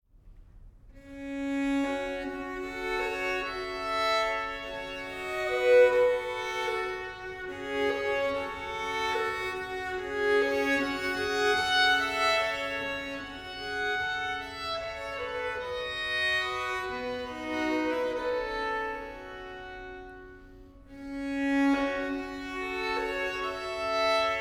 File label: The Dorian Pipes